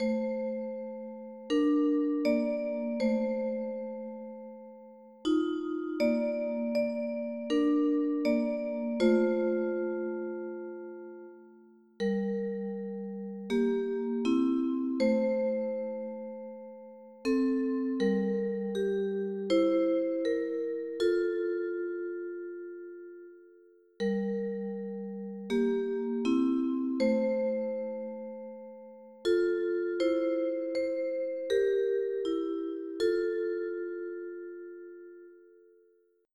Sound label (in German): Die magische Sternmelodie instrumental